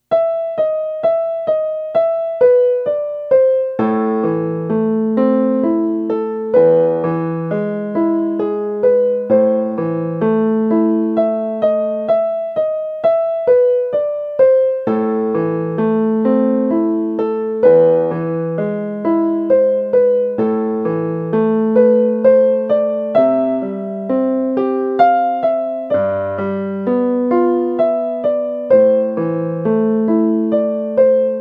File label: Duet; Fur Elise